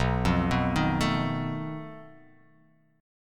B 7th Flat 5th B, chord

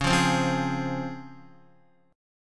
C sharp Major 7th C sharp, Guitar; C#M7 strummed; x,4,5,5,5,4; JGuitar

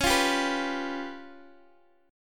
Db 7th Flat 5th, Guitar, Open D

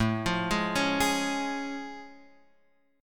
A Augmented Major 7th A Guitar Open F; arpeggiated

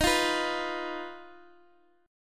Eb Suspended 2nd Sharp 5th, Guitar, Open D

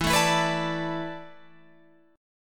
E 7th Suspended 4th E down Guitar Open F; E7sus4; strummed

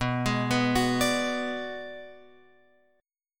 B Minor 6th B, Guitar Open F; Bm6 arpeggiated; x,6,8,6,9,9; JGuitar

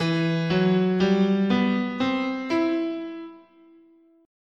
E Hirajoshi, scale

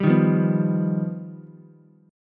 G Augmented 7th, Ukulele; G+7 strummed; JGuitar

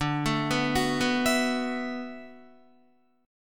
D 6th Add 9th, Guitar; arpeggiated; 4E,4A,5D,5G,5C,6F; chord

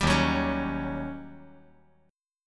D sharp 7th Sharp 9th D sharp; strummed; D#7#9; 0,3,2,4; JGuitar

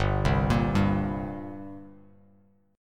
G Minor Double Flat 5th, G, Bass Standard; arpeggiated; JGuitar

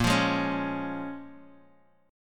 Bb Minor 7th; 3,5,4,4

